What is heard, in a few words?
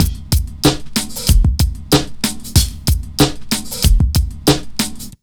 94 Beat, Underground Hip Hop; USB Soundscan vol.02; AKAI